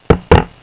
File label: Knock-Knock; Adium; AIM